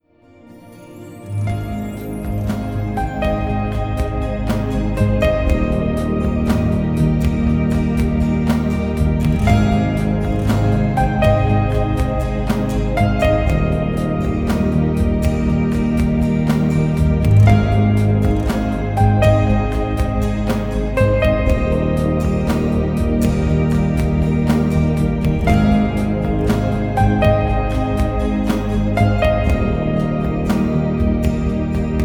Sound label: Piano Dreams